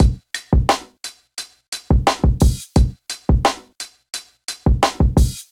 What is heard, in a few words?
Coldblood Kit, Drums; MPE Kits; Ableton; Factory Packs; Golden Era Hip-Hop Drums by Sound Oracle